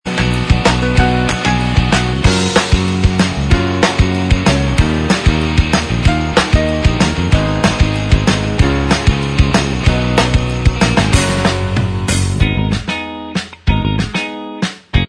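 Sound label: instrumental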